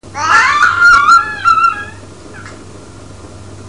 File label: squeek, baby; 5mnths